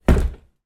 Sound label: household wheel barrow down inside garage